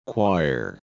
Choir

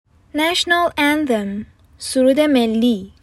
National anthem

